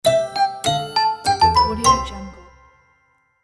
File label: happy cute musical ending; applehillstudios